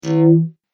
Space Metal Sound, science fiction sound effects

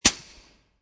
Suppressed Pistol Bridge, Cannon; 44 Suppressed Pistol Bridge; War; VRSonic

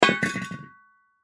Tire Rim Large Set Down Cement; Transportation Automobile; Car

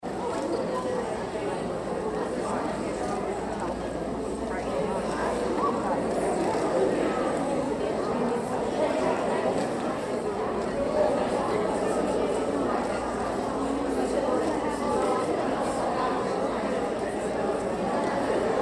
Crowd Women's Conf Auditorium Idle; Crowd , Int , Women ' S Conference , Auditorium , Idle Chat; Crowds

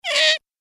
Cartoon Game Voice Waaah

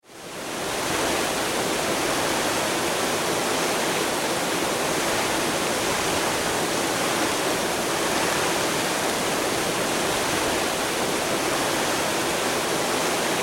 Water Fountain Run Large Public; Kitchen (Sound Effects:Household)

